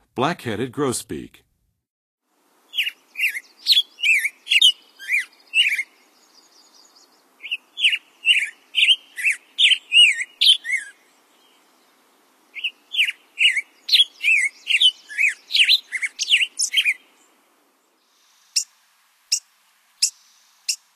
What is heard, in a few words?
Black-headed Grosbeak, Songs of Birds of North America; Western USA; Western USA Songbirds